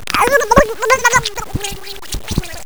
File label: drown; faerie